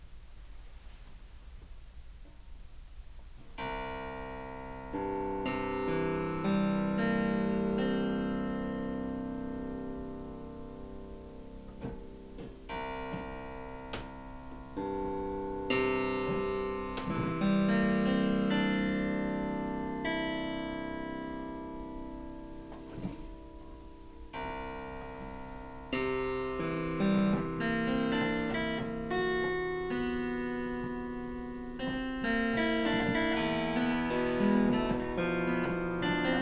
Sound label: Harpsichord